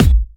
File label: Hard Kick; Vengeance Samples